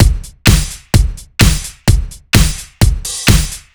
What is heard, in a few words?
Straight Hybrid Beat 128 bpm, drums; Loops; Ableton Live 12 Lite; Core Library